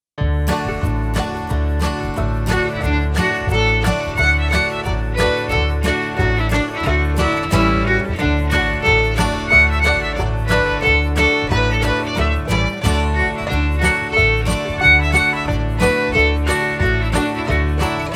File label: Violin